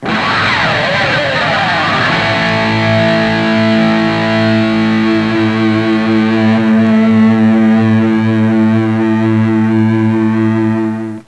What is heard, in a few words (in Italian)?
suonerie musica rock